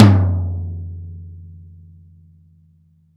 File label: TOM D, Drum Dry; East Collexion; Drum 1